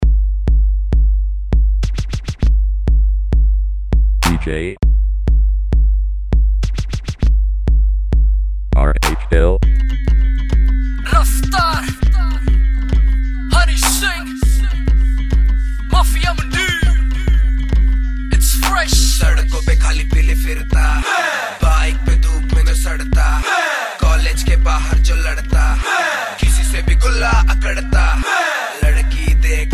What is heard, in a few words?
Bass Funk Mix; Punjabi Bhangra